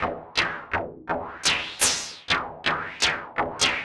perc noisy 125, Percussion Loops, Classic Chicago House